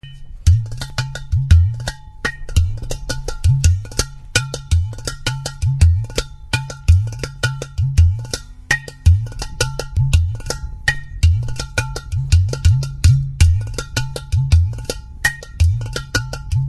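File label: gatham, tambours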